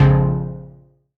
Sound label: stab tubed, stabs